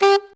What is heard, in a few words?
Notes G Sax; Scratch game maker software